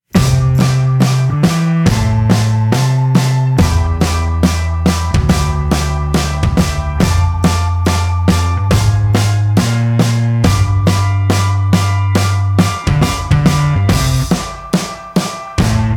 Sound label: Instrumentals